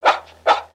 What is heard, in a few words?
Pet Dog Small Alert; creatures; pets; Lego